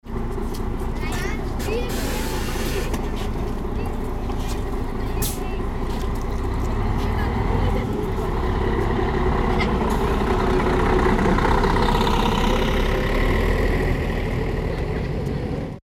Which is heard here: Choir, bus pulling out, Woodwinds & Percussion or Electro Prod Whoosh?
bus pulling out